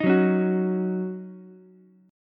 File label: D sharp Minor 7th, Ukulele; strummed; JGuitar